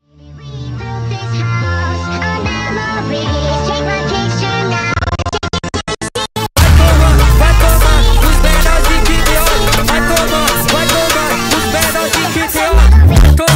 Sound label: funk sped up